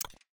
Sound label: ui click cancel